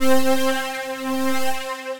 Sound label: Nostalgia Explorer Pad, Synth Pad